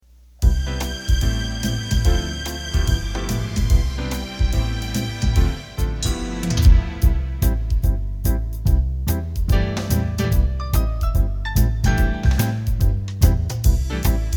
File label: Swing, accordion